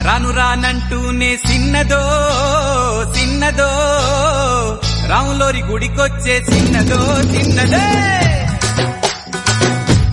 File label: Telugu Ringtone